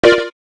digital, keytone; SKY IM-A600S Sirius

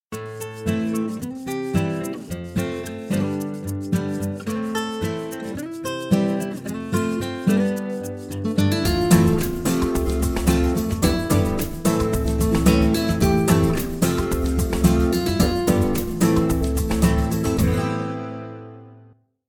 Guitar, alarms; Infinix NOTE 30

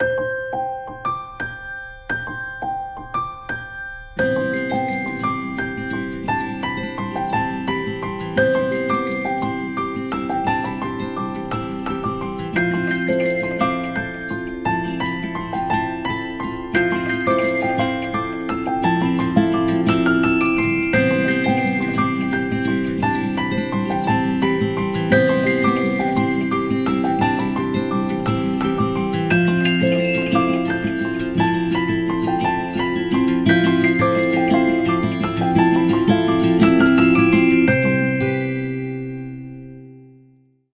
alarms; LG; Smart Folder X100S; Windflower

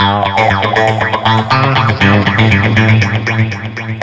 Groovy Loop; Philips; Multimedia Studio

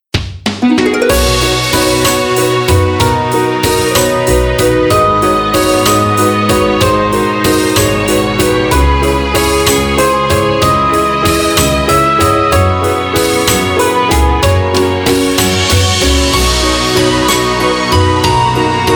Waltz With Birds; Pantech Vega Secret Note 2; sky ringtone

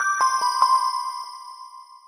music bell; Samsung GT-i7680; cmcc

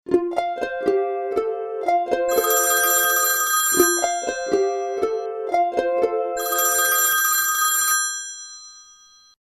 Sweet ring; ZTE F555-Aspect

